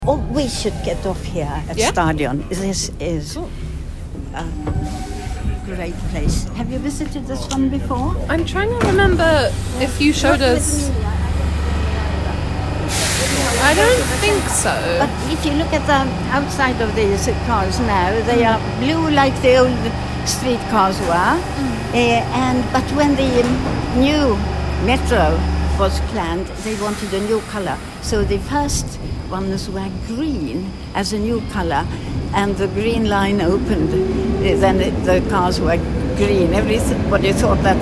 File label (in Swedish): stadion audio